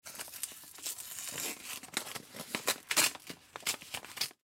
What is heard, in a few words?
Envelope Open; Envelope Open Mail Paper Tear Foley; Office; Blastwave